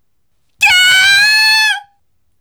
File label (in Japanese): voice himei